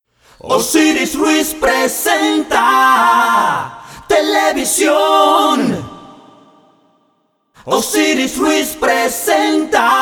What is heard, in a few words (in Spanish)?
Jingle VOCES, jingle cantado a capela